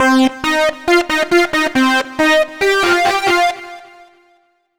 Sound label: Lead 138 C; Audacity; loops